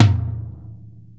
tom; DRUMS

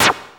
ZAP

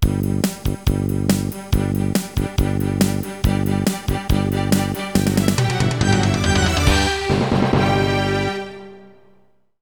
JINGLE

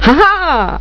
HAHAAA; HUMOR

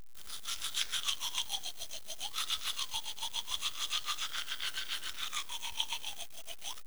TEETH

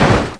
COLLIS

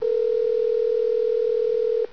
DIAL RG

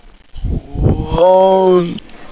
liz hit; Gloom3 rejects

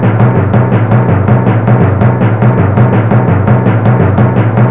TROMM

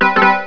EPIANO

